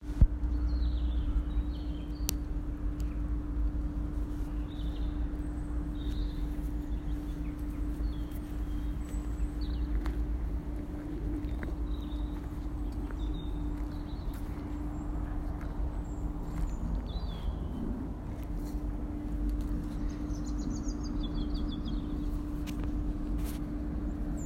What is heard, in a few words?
Stroudwater Canal Robin